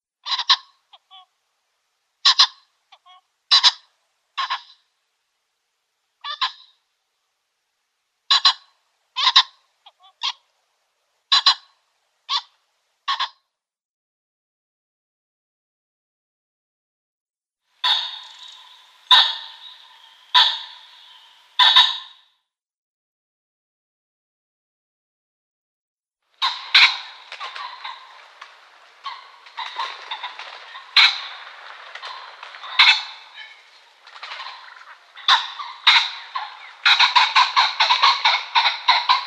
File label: Ring-necked Pheasant male calls